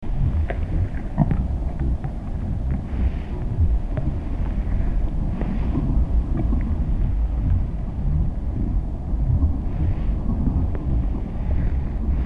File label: PRE-NATAL